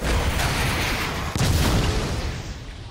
missile launch hit

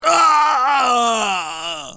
alien death sound

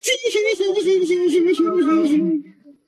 tee hee hee; tricky dicky

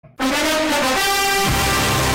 epic intro

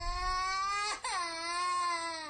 annoying kid crying

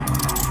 movement

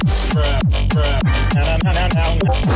meme music

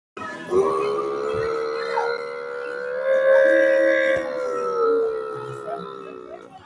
motercycle botton

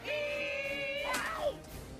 heyaaaa

